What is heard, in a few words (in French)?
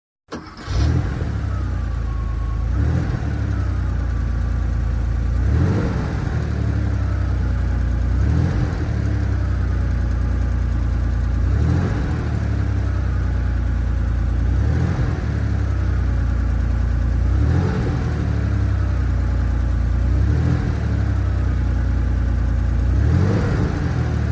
930 turbo, Porsche, Démarrage / départ